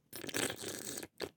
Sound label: glue slurp